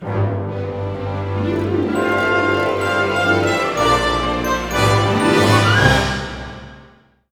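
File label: 132, orchestra; East West; Scoring Tools; Magic Start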